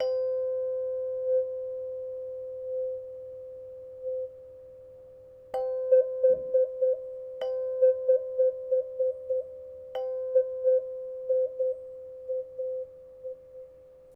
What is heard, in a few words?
wah wah wi wah, chimes